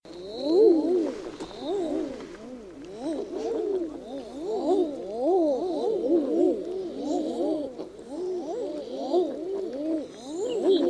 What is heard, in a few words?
common eiders in tundra; soundscapes